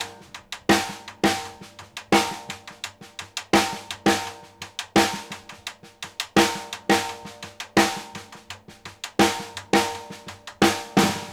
RIM + SNARE, 84; Sampleheads; New York City Drumworks VOL-1; SP ORLNS 84

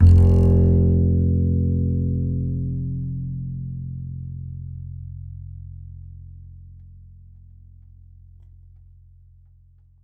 E, Upright Acoustic Bass

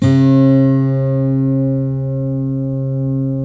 GUILD 12 D 3, 12-STR; Spectrasonics; Hans Zimmer Guitars VOL-1

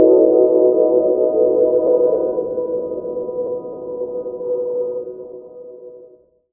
pad mysticfade; Sonic Foundry (Sony Creative Software); Ugly Remnants VOL-2

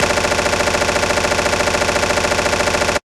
Machine Gun; Roland BK-5

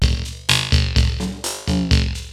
LOOP, TECHNO; TECHNO-BOX; ELEC-LOOPS

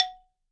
balafon, f#3; World Instruments 2; WORLD PERCUSSION; PS BALAFON

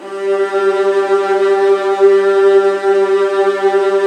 G1, Virtuoso Strings

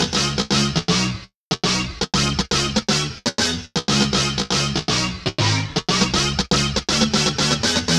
Guitar Based; Eclectic Electronica

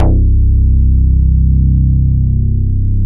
MKS BASS

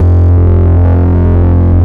BASS, C2; Zero-G; Chemical Beats; BASSES

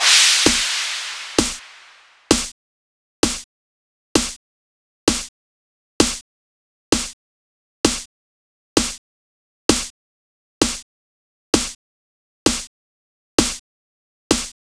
Crash Snare, Big House; Sonic Foundry (Sony Creative Software); Afterhours EDM

